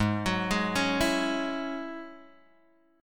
Ab 7th Suspended 4th Sharp 5th Ab down Guitar Open G